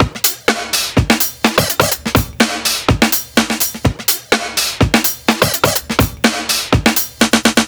Bell Kit C, брейкбит; сэмпл ударных

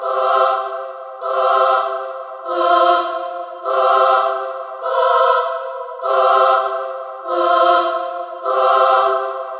Blackthirst Choir, метал

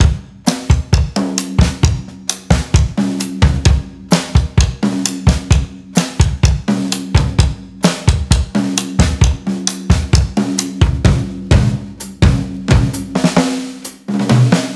Slow B, латино

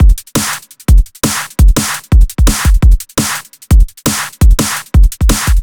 сэмплы ударных drum bass

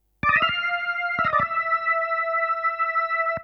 Single figure, джазовый сэмпл keys; jazz

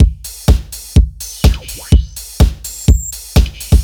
Futuristic A, сэмплы ударных дэнс электро

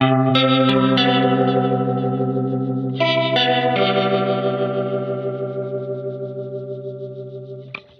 сэмпл гитары rock alternative

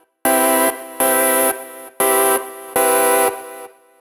Morgana Sun, орган

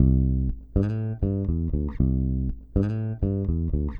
Pending A, джазовый бас сэмпл; jazz

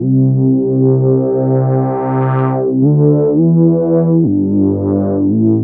Percy Bass backing, звуковая атмосфера drum bass

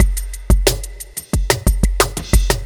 сэмпл ударных chillout; Sunset

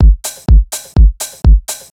сэмплы ударных дэнс электро

